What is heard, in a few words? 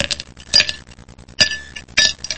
cocktail, household sounds